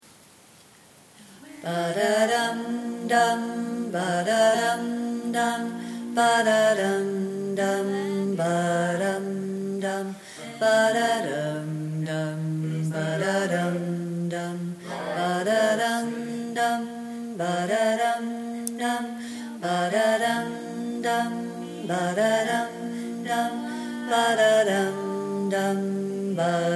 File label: tenor